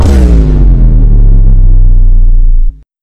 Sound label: Bass Drop Vine Boom Sound Effect; Pilot PulseBlade Activated 1P